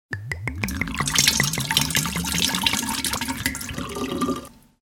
voda v trychtyri

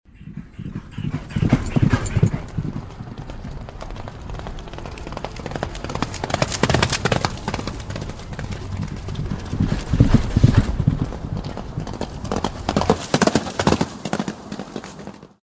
jezdci na konich

